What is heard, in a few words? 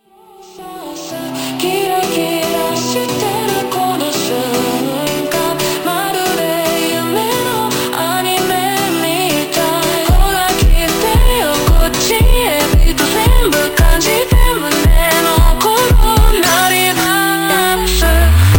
Montagem super Slowed